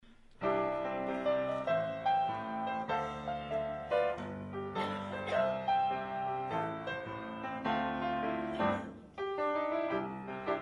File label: Piano Offertory; He's Got the Whole World